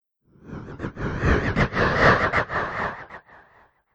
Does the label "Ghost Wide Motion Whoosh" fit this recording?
Yes